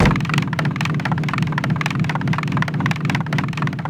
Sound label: Barrel Rolling